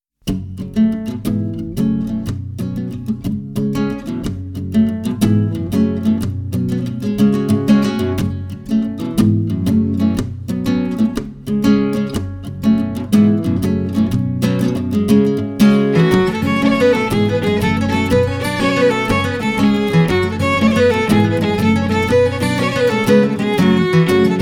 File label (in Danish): dobbelt jig